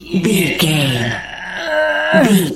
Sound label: voice male effort push slow